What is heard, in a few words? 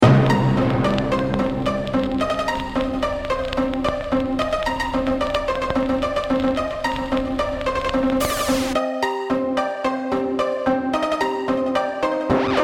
crush